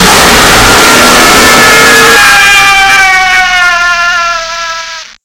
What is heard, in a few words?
jumpscare sound; fazbear return 5